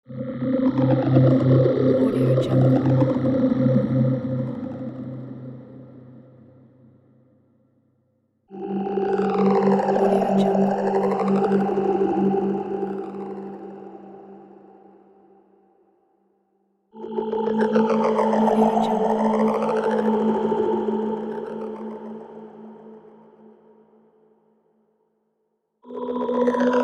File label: radioactive ambience